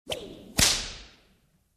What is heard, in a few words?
Whip hit single